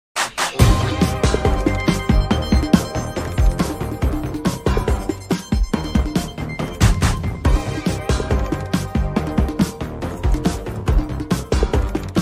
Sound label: instrumental; Hearthstone - The Boomsday Project